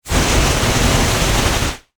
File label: Flame Hand Booster, Scifi, Flame, Hand, Booster, Long, Burst, Science, Fiction; Spacecraft